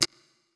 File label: click; ImpGal2